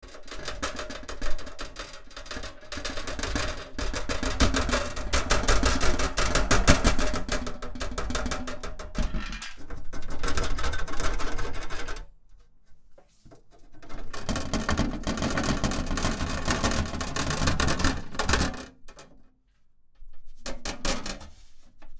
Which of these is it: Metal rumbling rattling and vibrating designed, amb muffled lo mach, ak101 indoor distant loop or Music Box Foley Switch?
Metal rumbling rattling and vibrating designed